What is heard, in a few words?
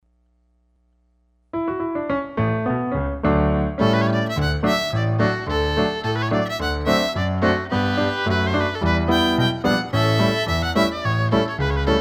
Fiddle camp two step